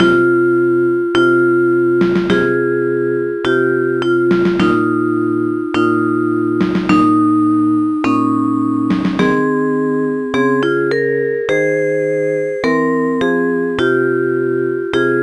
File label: lose; Sonix Music Driver